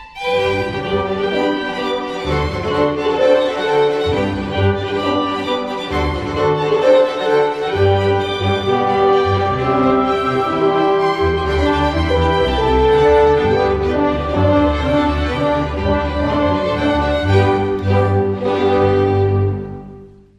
violin concerto no 3 in g major

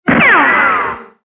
bullet sms sound

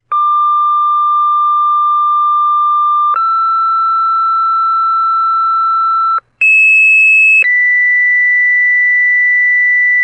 fire pager ringtone

